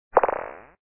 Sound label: ping; hit the looser